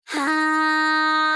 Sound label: "ha" D#4; BanG Dream